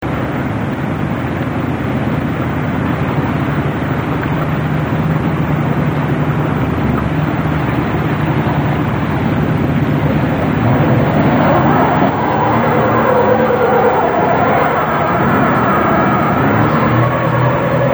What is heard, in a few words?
blaster burnout, truck